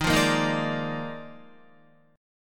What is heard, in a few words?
D sharp Major 7th Suspended 2nd Suspended 4th, down, Guitar; strummed; JGuitar